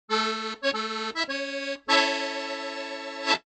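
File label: accordion piano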